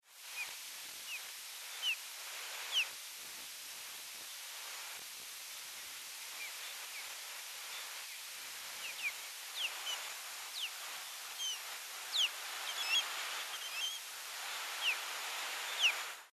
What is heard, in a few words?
osprey, Belize birds birdsongs